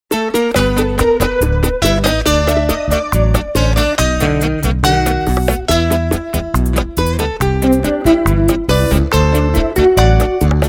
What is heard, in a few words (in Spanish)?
Bachata